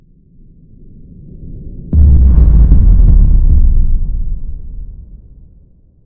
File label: explosion